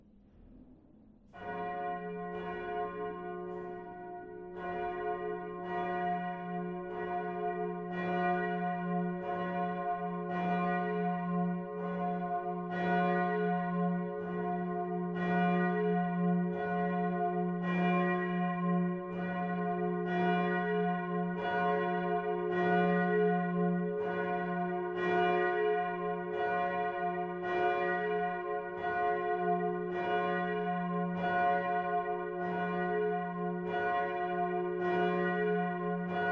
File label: bell; southtower; leonhard